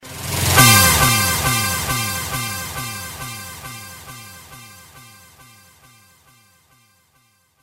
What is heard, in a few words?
sms tone